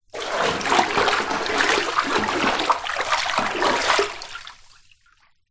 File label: household bathtub water movements; zapsplat